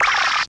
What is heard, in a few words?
jump; alienarena; martianenforcer